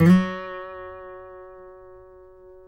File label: F#, guitars